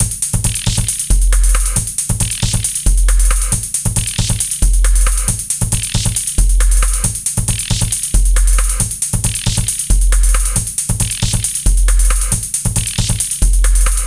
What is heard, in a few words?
Fast Upbeat